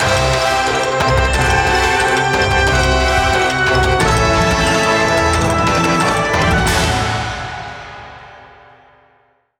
Victory Score

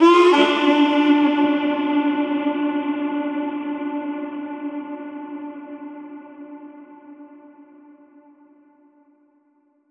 Harp Short; ps vita

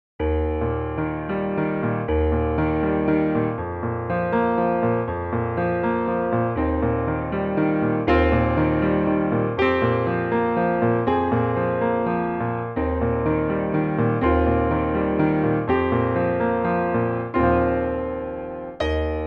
Green Bird Piano; Realism

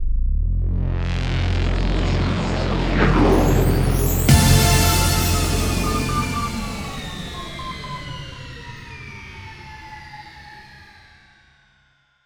Space Rave Victory Sound; Squish

